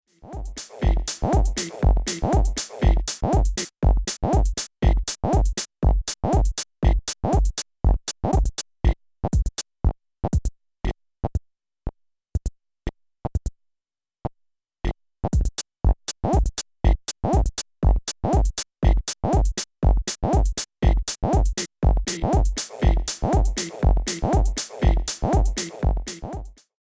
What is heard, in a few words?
Reloop KUT Gate